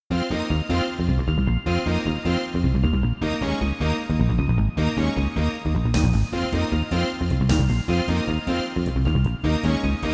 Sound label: CD Audio; Raiden